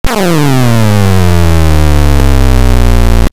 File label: drawing; cavernQuest